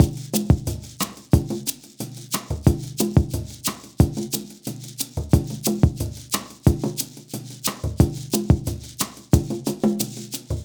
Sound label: breaks